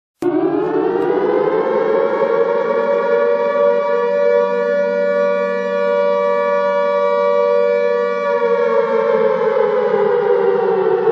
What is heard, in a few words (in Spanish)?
alarma unidad dejo reportar